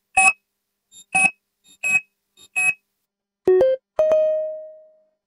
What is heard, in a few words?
Airlock Confirm; transporter lock